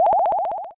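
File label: player burst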